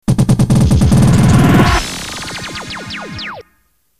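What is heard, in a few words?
jingle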